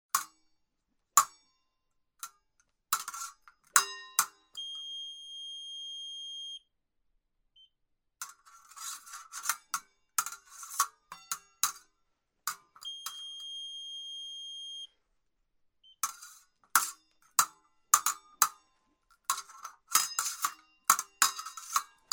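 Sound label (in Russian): звук сабель и сигнала касания противника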